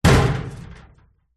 impacts metal hollow cabinet old knock hard rattle